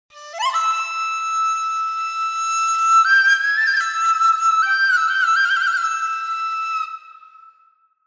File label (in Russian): schastlivaya kitajskaya novogodnyaya flejta